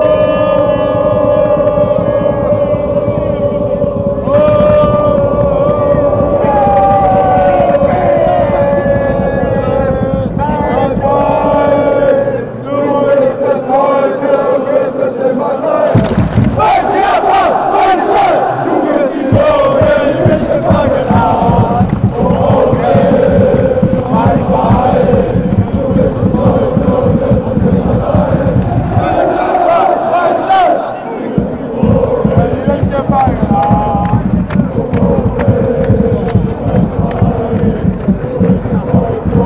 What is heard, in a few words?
chant, moenchengladbach